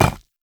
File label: Footstep; Warframe; Qorvex